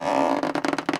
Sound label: Scale in